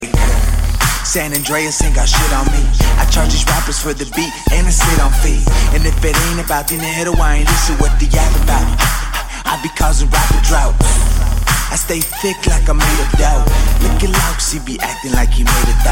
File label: Block Shakers